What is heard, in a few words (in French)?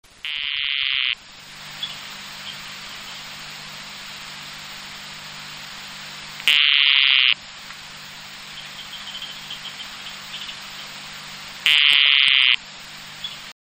chant tinctorius